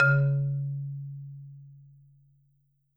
C#2, Marimba; hard F; Sampleheads - Dave Samuels Marimba & Vibes

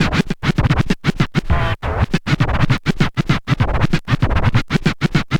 scratch med, Vinyl Attack scratches mix

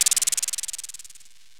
ELECT PERC; OMI - Universe of Sounds

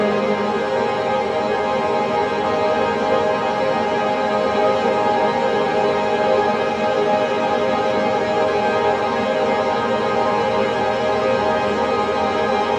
Useless Pad

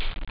hihat; random; ST-XX Amiga Soundtracker Sample Packs

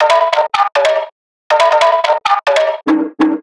synth loop percussion expedition 140 D#; Virtual Riot

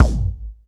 BD, Bassdrums, Roland TD-20

